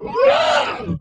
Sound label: pterodactyl death